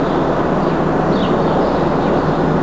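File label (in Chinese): 城市喧闹; 红色警戒2; 环境音效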